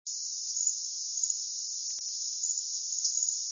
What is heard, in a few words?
junco; birdsongs